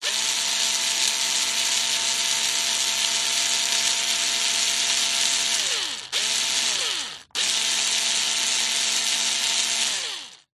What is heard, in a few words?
industrial power tool electric screw driver bursts; zapsplat